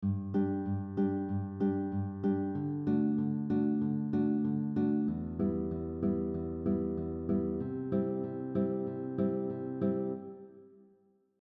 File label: Finger picking pattern using 4 fingers, guitar; Your thumb (finger 1) moves down while your pointer, middle and ring finger move up at the same time